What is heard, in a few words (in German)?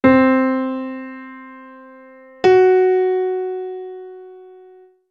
tritonus, gehoerbildung